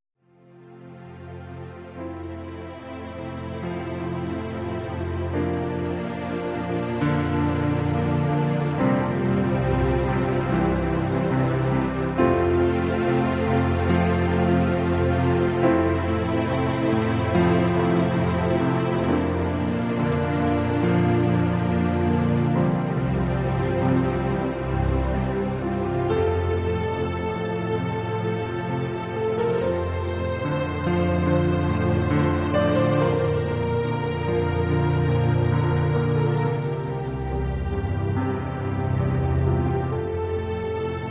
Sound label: String Dream